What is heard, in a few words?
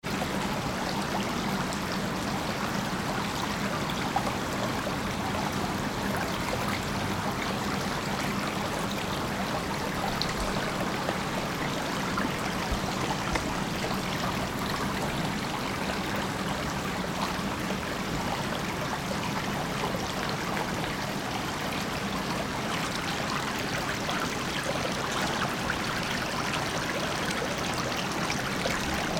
river